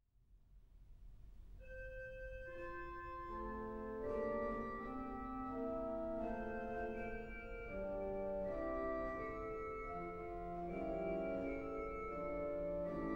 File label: Verset, For Organ Harmonium; Keyboard; Saint-Saëns, Camille (1835-1921); 2 Pièces Brèves